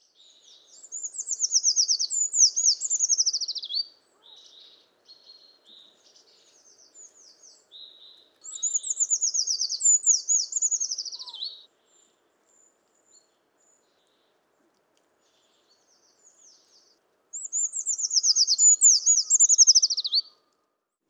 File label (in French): Grimpereau des bois; sonotheque ornithologie